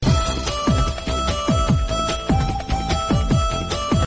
background music message alert tone ringtone, sms ringtones